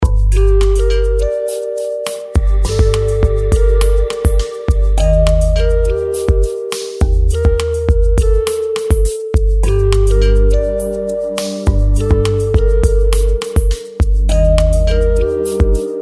CRYSTAL KEYS

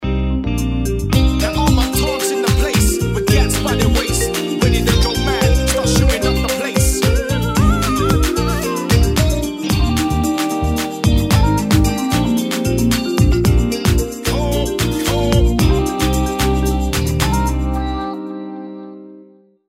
HIP HOP

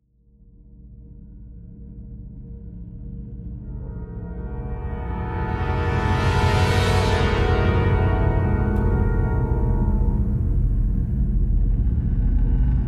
TENSION

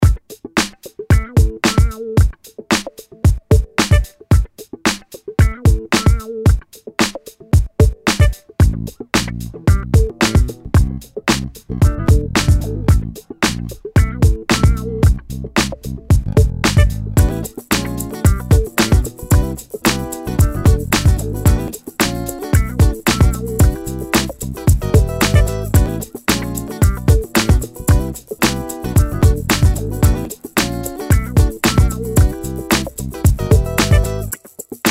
FUNNY FUNKY

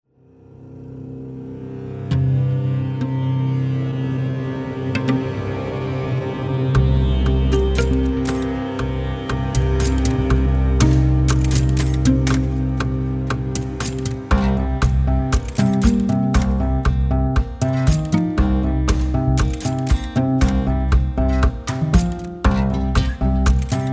STRINGED

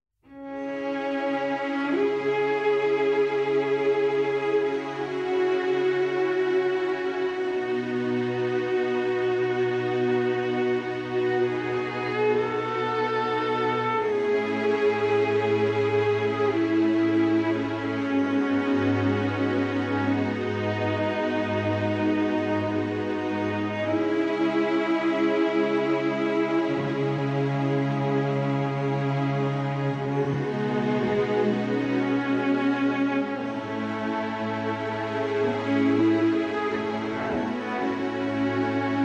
CELLO MEMORIES

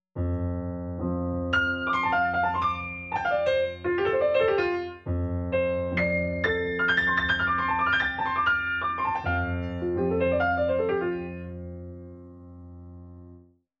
VIRGULE PIANO

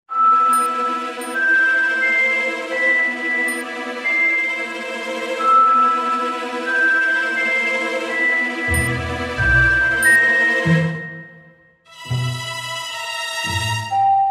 AMB FEAR

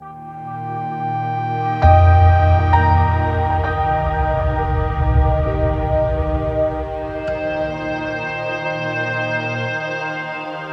KEYS

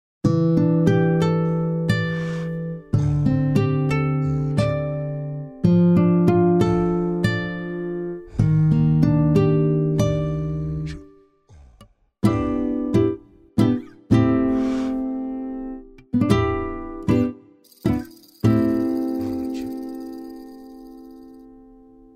ARPEGGIO

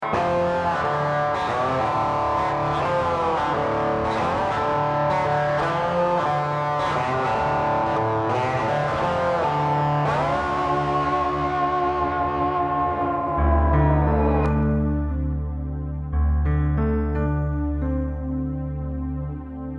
SLIDE DOWN

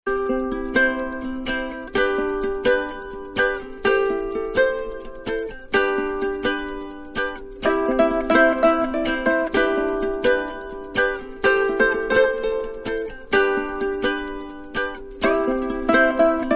UKU TAHI SOLO

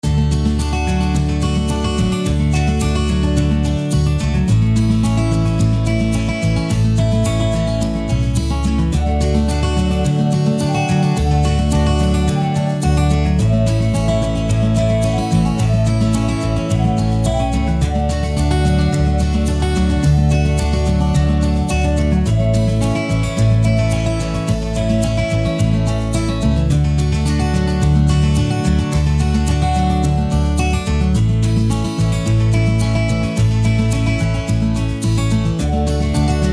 FOLK

